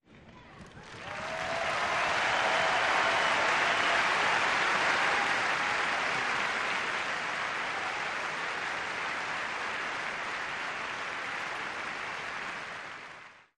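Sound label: Crowd 50000 Whistle, large int; Crowd ( 50, 000 ): with Whistles / Mostly Applause, Starts / Dies. Cheers, Crowd, Huge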